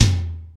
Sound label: TOM TOM, Real